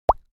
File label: Mouth pop